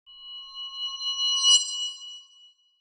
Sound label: ambient